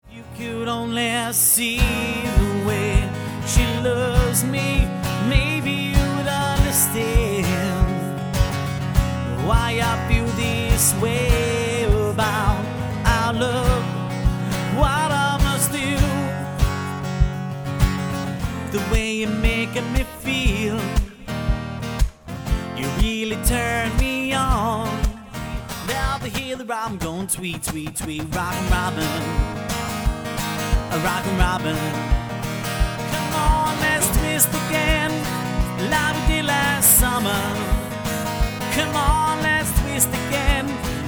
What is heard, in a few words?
acoustic party